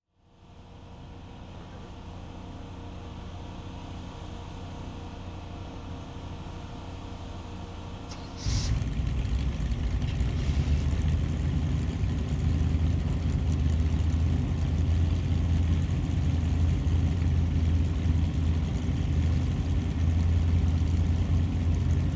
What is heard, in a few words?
SR-71 Engine Start